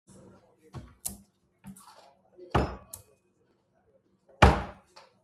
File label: cupboard